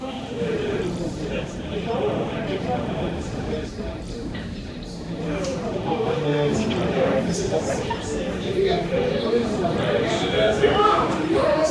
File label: a busy medieval pub with